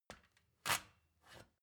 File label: step ladder metal scrape single